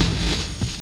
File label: SD, Dark Side Of TripHop